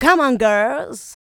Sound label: vocal house adlibs; PS-28 Vocal House Samples